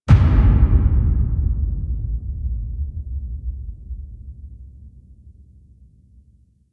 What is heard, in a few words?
Throne Collapse Intro; Duviri; Thrax Throne Collapse